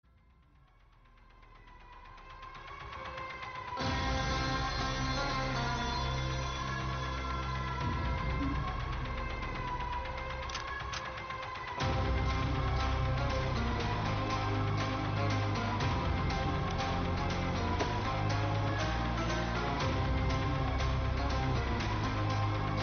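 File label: Interval Background Music Tone Ringtone; ringtones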